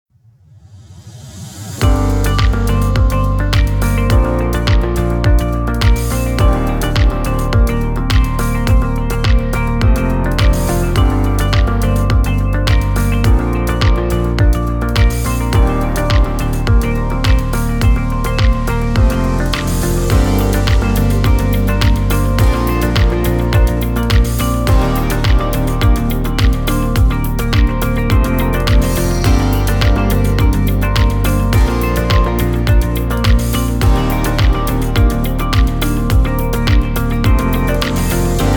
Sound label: Calm Corporate Confidence